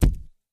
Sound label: Camera Flash Pot De, cameras; Camera flash, flash pot, deeper, less explosive